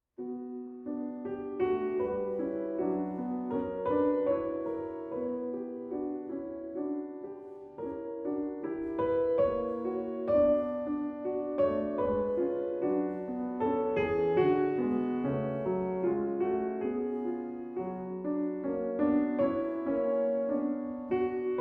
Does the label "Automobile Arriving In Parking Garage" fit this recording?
No